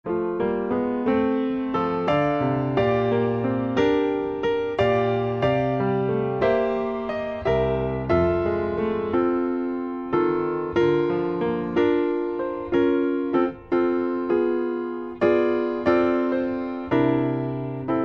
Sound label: piano